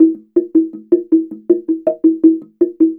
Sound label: SLIT DR PH, SLIT DRUM; Spectrasonics; Heart of Africa 2